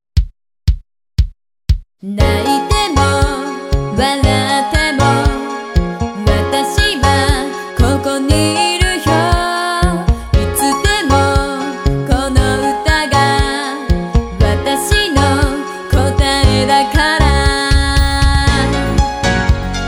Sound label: Singer song